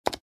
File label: Sharp calculator single button press